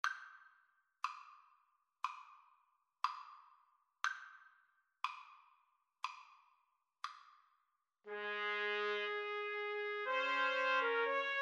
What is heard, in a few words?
romance 3TPT, Trumpet Trio